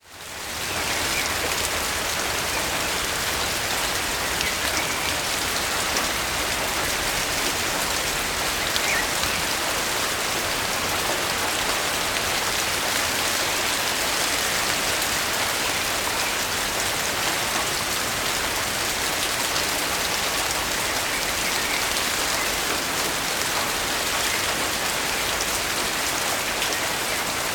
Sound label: ambience